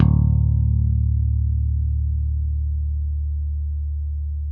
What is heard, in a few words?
BASS B1, ELECTRIC BASS